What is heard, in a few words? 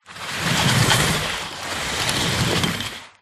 office chair on carpet roll